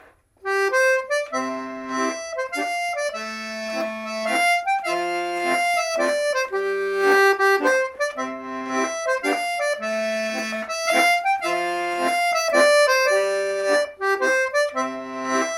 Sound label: mazurka; Accordéon Diatonique